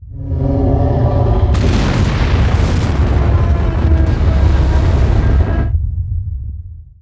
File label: Ship takeoff; Droid Core Ship; Star Wars Clone Wars; XBOX